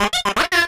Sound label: DUCK, Anime Sound FX; COMIC